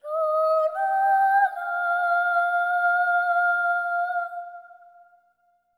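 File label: BOY phrase, Symphony of Voices; Spectrasonics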